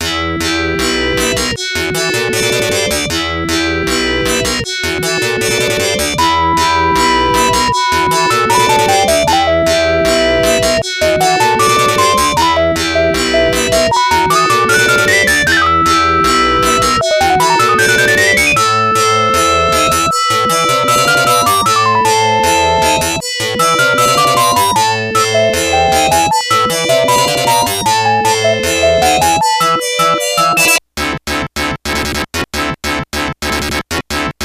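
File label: background music; Bionic Commando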